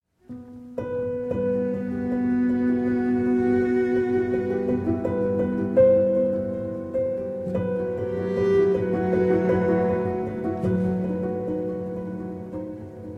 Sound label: Prelude in E Minor for Piano, Violin, Cello; Op. 28, No. 4